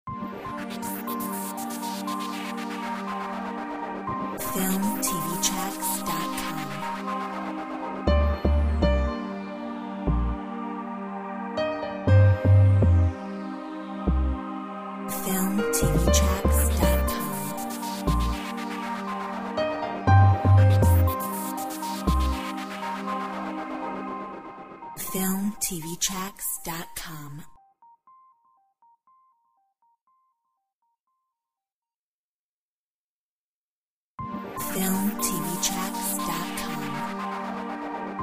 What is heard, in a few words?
Corporate